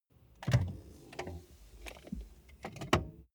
car bmw e46 interior seat pull forward

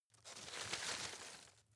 shake medium, foerster; berufe

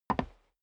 step boots wood creak single left; Footsteps; schritte

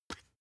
spice jar smooth open soft; industrie wirtschaft; gastronomie

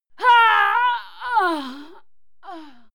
female fight die

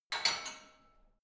barbell only replace, fitnessstudio

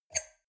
wine glass grab table slide medium short; soundpool

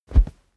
whoosh cloth low soft; swooshes-swishes

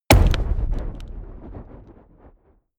fire impact; explosionen; comedy-cartoon; soundpool

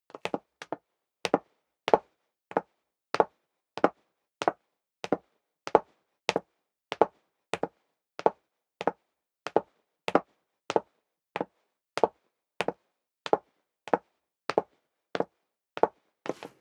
step high heels wood parquet downstairs sequence; schritte

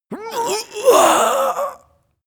male death; schreie; menschen; soundpool

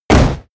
hit sweetener; impacts

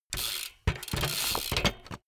bmx bike pedal grip freewheel

